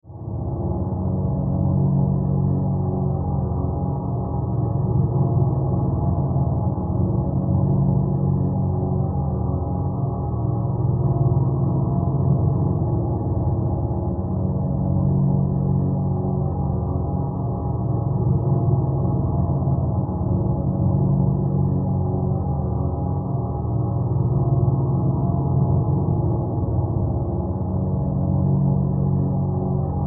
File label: Horror Drone Ghostly Dark; Scary Tones; Sound Effects:Horror